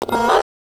Tech, Weird Side Samples

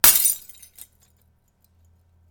Glass; FXHome

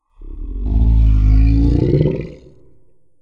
Monster; Membrans - Monster Pack 01

